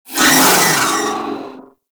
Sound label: Vocal Pain; Enemies; Warframe; TheNewWar; Archon; Nira